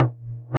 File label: EQ Perc, Percussion Mix